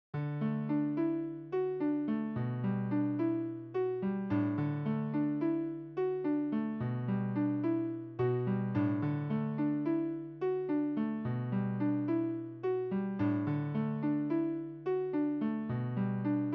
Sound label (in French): Tenor